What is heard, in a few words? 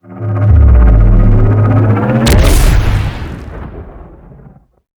charge explode; cinematic